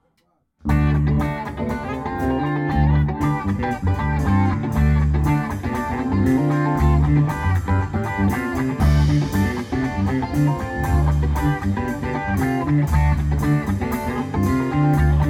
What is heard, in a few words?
Funky Part